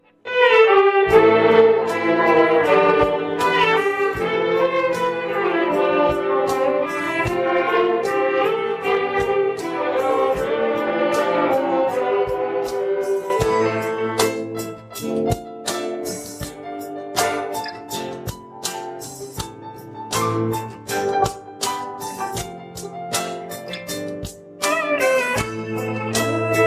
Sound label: Violin Ringtone